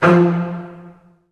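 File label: Bass Spiccato F2; Digital Sound Factory Virtuoso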